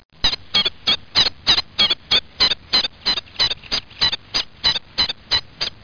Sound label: tilt